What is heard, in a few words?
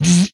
Explosion, retro arcade; MMRetroArcadeSoundsPack